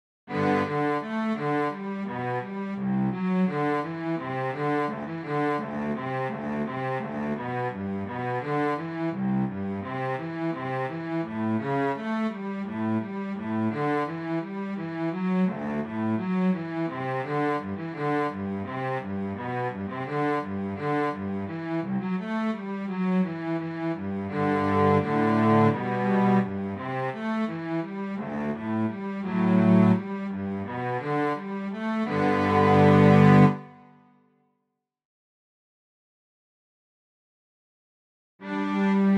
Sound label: cello